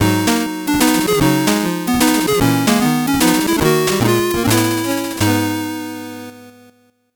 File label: 8bit jingle slow